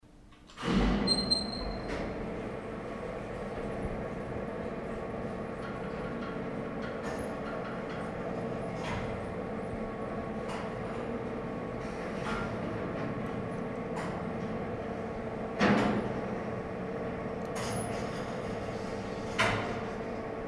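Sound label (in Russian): podem na lifte, elevator ride up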